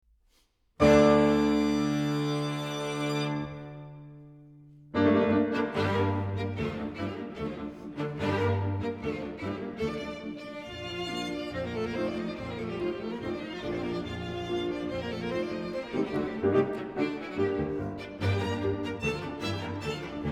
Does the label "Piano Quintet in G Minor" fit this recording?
Yes